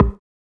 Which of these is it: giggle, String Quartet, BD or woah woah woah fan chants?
BD